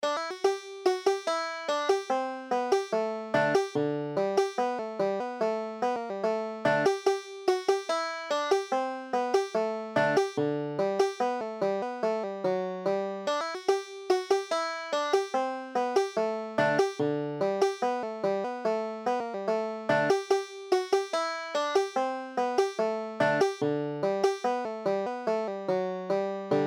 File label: mr hopple, banjo